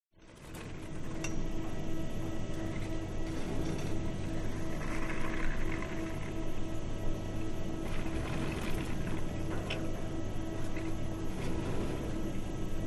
RESORT Ski Resort Lift Close; ATMO; smartsound

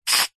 household aerosol deodorant short spray; zapsplat

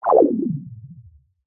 cartoon anime swell warble power descend

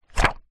foley paper page turn large binder; zapsplat

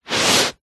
foley footstep scuff barefoot on carpet; zapsplat

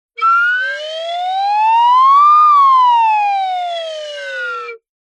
cartoon slide whistle double pitch up down

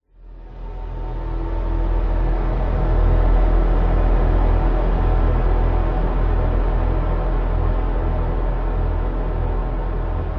horror atmosphere dark eerie chilling; zapsplat

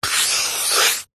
office pen felt marker single stroke on paper

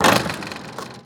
Basketball Shoot; Blastwave FX

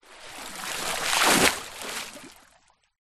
nature water movement surface splashes deep; zapsplat

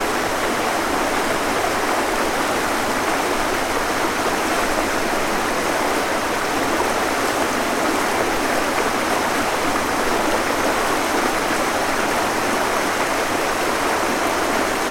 water river shallow fast; sound effects one

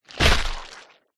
horror gore impact thud bone break squelch

